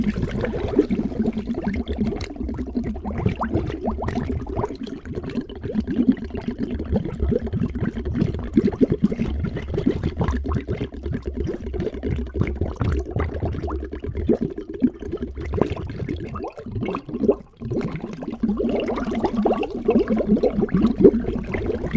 liquid bubble continuous with low resonance cavity loop; sonniss gdc